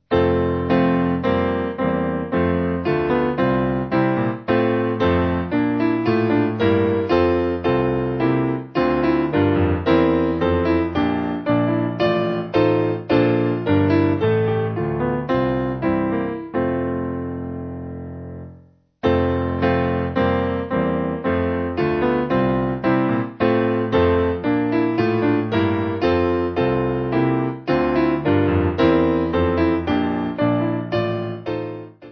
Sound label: Theres A Wideness, Piano; Cross Of Christ